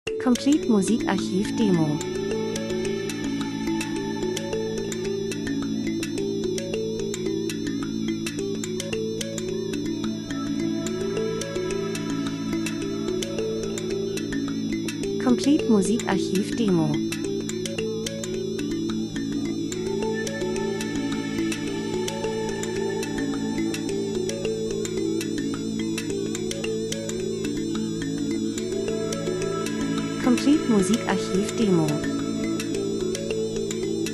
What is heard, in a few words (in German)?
drums, rhythmus; Fremder Rhythmus Spannung ferne Länder Kulturen Menschen Monoton Reisefilm Dokumentation